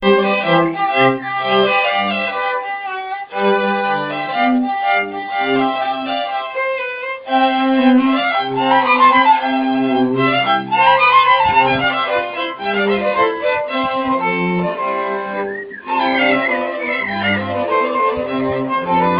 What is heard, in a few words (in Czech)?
kvarteto